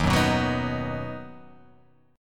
D sharp Minor Sharp 5th D sharp down

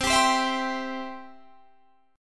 C Major, C, down, chord; strummed

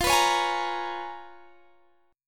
Db 13th F, guitar

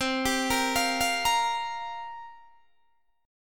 C 7th Suspended 4th, Guitar, DADGAD

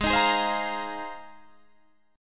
Ab 6th Add 9th Ab, Guitar, Open D

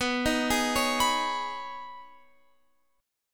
B 6th Add 9th B down Guitar Open F; B6add9; arpeggiated

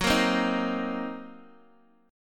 Fsharp 6th Flat 5th down Guitar Open D

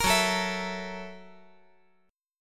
Gb 7th Sharp 9th Gb

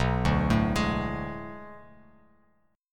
B Minor 13th B down Bass Standard; Bm13 arpeggiated; JGuitar

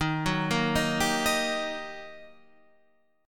G Minor Major 7th Sharp 5th, Eb

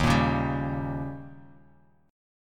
D sharp 7th D sharp; strummed; JGuitar

